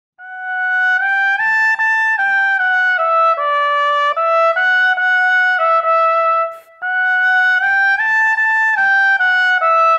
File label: trumpet